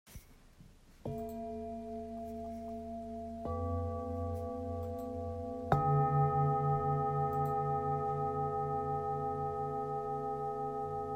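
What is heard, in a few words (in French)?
bols etoile filante, bols bengale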